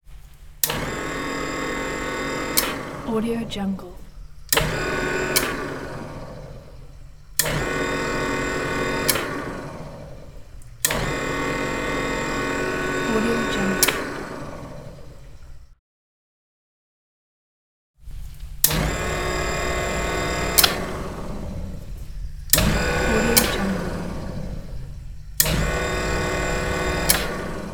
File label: robot turret servo bursts; prosoundfx